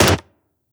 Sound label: Syringe Gun; Weapons